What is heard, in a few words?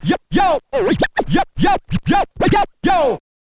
scratch kit, scratches; Masterbits; Vinyl Attack